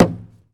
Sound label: Baseball Hit Wall